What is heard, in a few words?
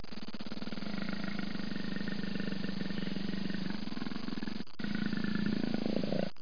leopard